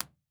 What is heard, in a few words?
IR Bin Rear Left, Listening Room; IR Binaural 16bits